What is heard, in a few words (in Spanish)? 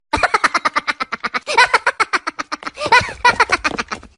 risa minion